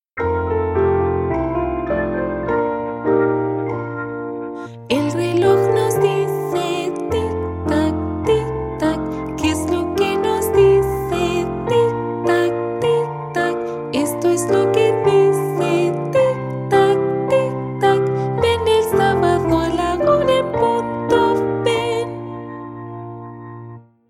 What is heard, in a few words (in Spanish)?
Tic tac